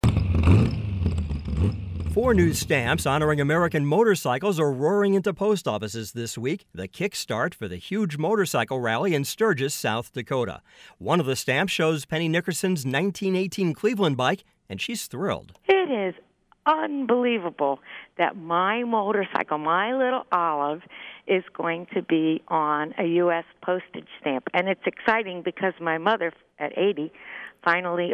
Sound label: motorcycles; radio